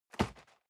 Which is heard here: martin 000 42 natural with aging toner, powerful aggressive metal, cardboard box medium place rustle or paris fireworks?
cardboard box medium place rustle